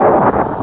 Thud; Risc World 8; SF3000

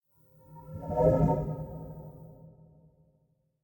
warp; Keepsake

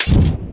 FIOL BOUM

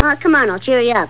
cheer

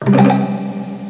puck start

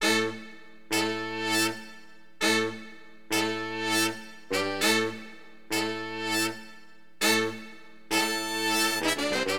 FNK HORN, AcidJazz Funk Wind; LiveAct Pool 1

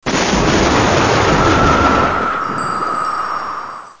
punch in; The Lord of the Rings The Two Towers CD Cardz Rohirrim

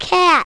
cat; animal fun 13

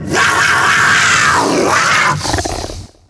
medusa die; DAoC

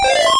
POWERUPS

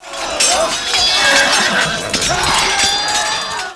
cavalry; civ2

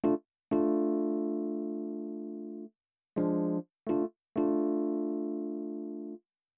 synth; bombogroove 10

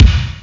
drums bd; Atari Mega Archive

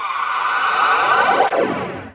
death orb; murk

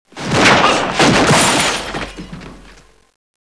explode

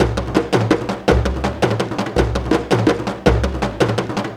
Tribal; Sonic Foundry ACID Music

